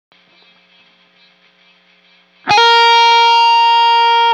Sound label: Intro Single Note